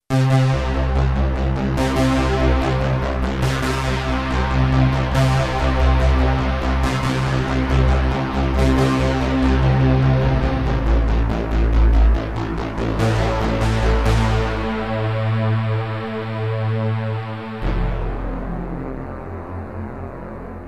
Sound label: D-50 digital atmosphere